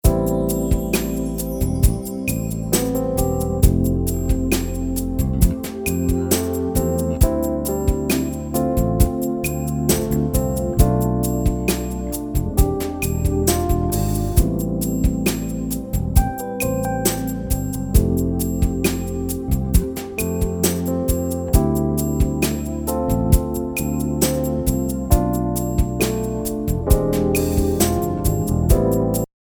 Rockballad

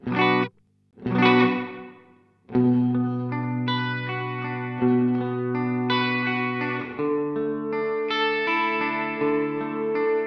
Earthquaker Devices Ledges Room alle Regler auf 3 Uhr

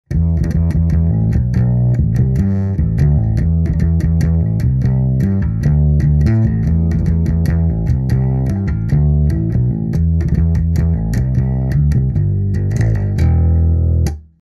mit Box fretless mikrofoniert; TTE500

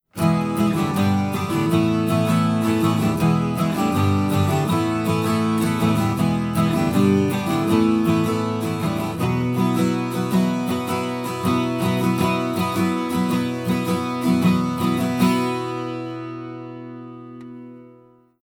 12 string 4 Gitarren alle EQ bypass

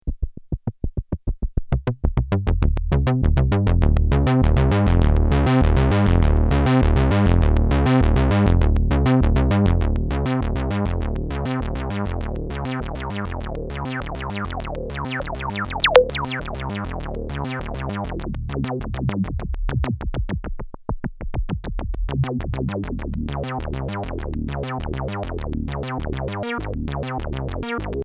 filter res aufdrehen

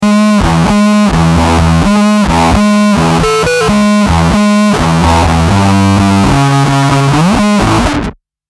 Git. + Square vs. Big Muff; EHX MS

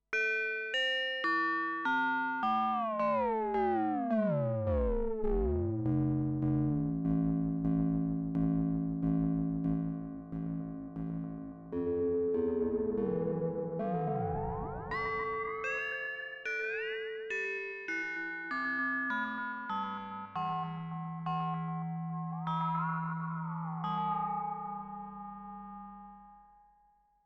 MOOG MATRIARCH echte Ringmodulation

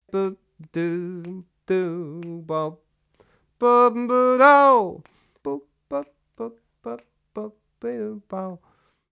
Male Voc, unkomprimiert

